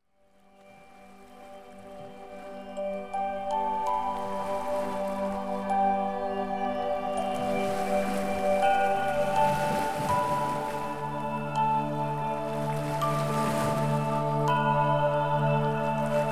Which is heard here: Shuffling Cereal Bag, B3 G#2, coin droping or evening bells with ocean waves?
evening bells with ocean waves